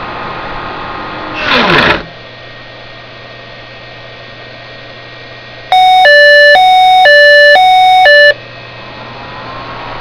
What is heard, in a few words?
warning